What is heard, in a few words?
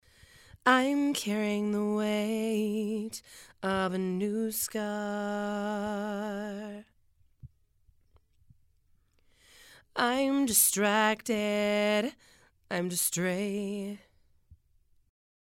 Female Vocals